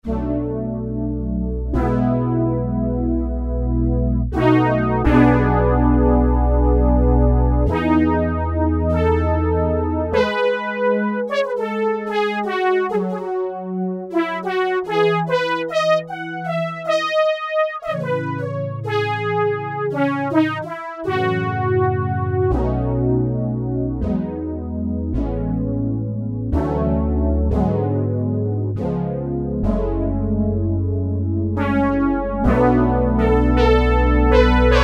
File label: Breathing Brass, Roland MKS-70